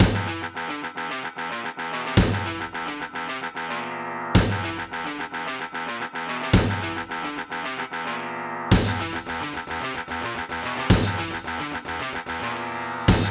LG MIDI ART GS1000R; Doom

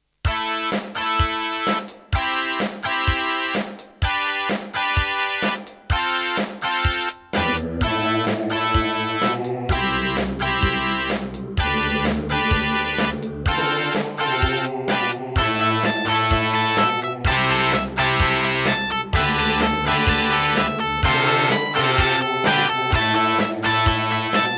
Hammond GM-1000; raptor call of the shadows

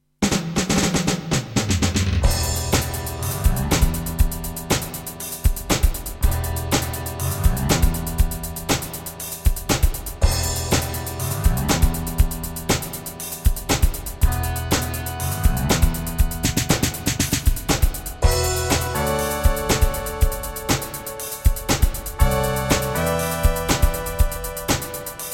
Credits EMU SoundEngine; Dune II

